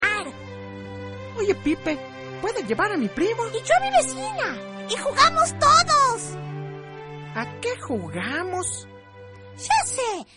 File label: pipe